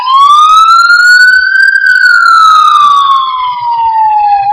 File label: aus siren; gmod; lwsirens